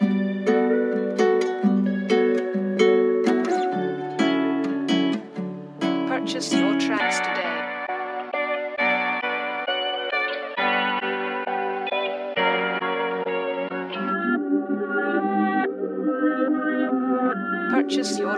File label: Loop Kit; WavSupply; Manso; Prophecy Loop Kit